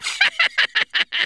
laugh, Redneck

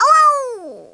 OUCH, Polish; 1997 MMX LAN PACK (win 95); Worms Armageddon

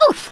oof, Drill Sergeant; Worms Armageddon

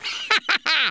laugh; Worms Armageddon; US Sports

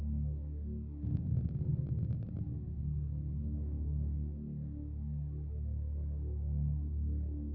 menu loop

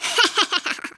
laugh, Hispanic; 1997 MMX LAN PACK (win 95); Worms Armageddon

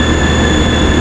heavy hover idle; 1997 MMX LAN PACK (win 95); Army Men

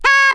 jump, Speech; 1997 MMX LAN PACK (win 95); Worms Armageddon; Formula One

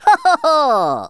laugh; Worms Armageddon; Rasta

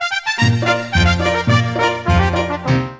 Trumpets; Fanfare; 1997 MMX LAN PACK (win 95); Worms Armageddon

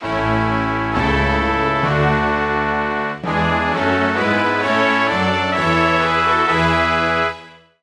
Russia, Fanfare; 1997 MMX LAN PACK (win 95); Worms Armageddon

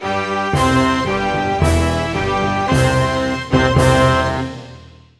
Australia, Fanfare; 1997 MMX LAN PACK (win 95); Worms Armageddon

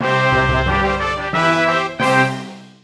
Civilised, Fanfare; 1997 MMX LAN PACK (win 95); Worms Armageddon

